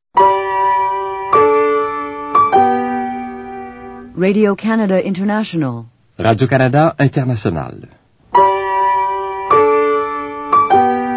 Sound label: interval signal; rci